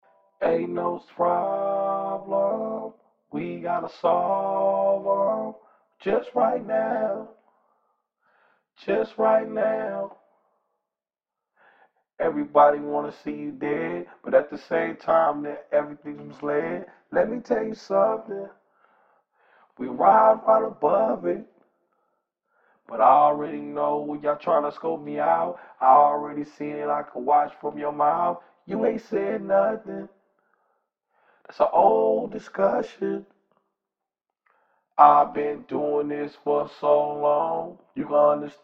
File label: hip hop 83 Dm; acapella